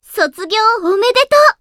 applause

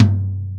Mid Tom

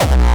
Tritone Kick

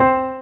Synth; osu

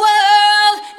vocal

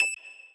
lead

